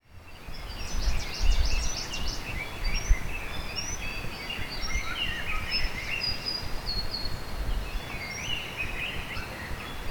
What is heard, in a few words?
Sounds Of Emei Shan Mountain Birds River